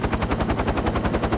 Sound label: UH1D-Loop, Helicopters; Platoon; Vehicles